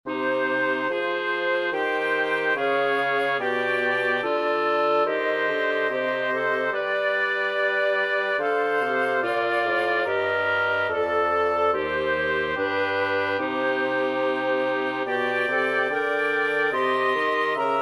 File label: woodwind quartet; windkwartet; Wir glauben all an einen Gott; Kwartetten